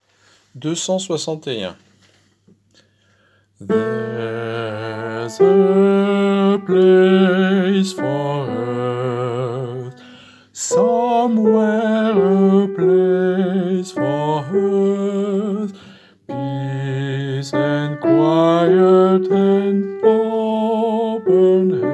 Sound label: alto